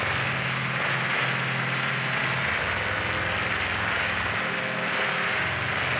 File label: Panned Organ, non tempo loops; stereo toolkit samples